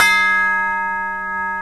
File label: DULCIMR KOTO; OMI - Universe of Sounds